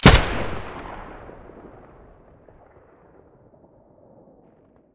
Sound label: abakan muzzle stereo; STALKER; IWP